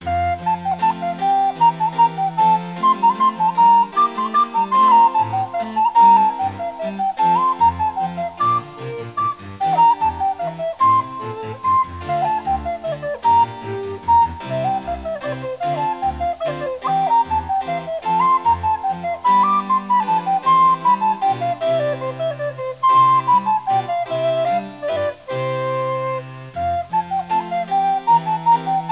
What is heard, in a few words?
cow